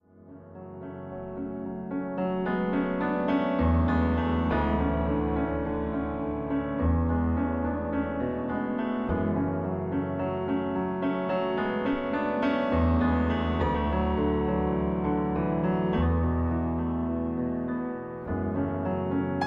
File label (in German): Schlaflied